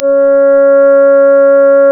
C#, Synclav FM, WIND; Big Fish Audio

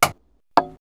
Tock, perc fx; X-Static Goldmine

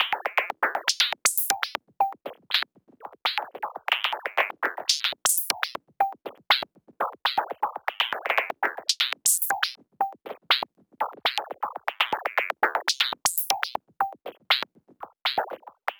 Anabeat Mix, Beats

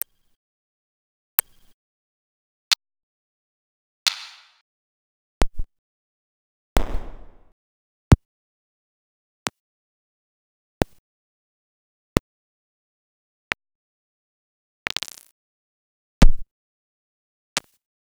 Tips & Snaps; X-Static Goldmine 5

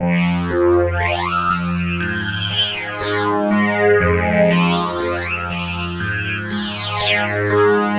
Swirl Synth, Synth Textures; Sonic Foundry (Sony Creative Software); The Electronic Music Manuscript Collection

